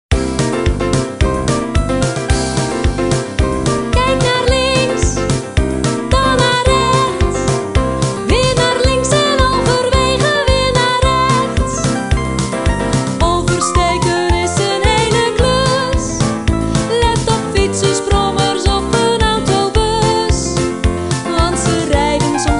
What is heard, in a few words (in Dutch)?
karaoke